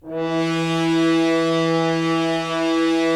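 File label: Solo French Horn; Roland - LA Composer vol. 4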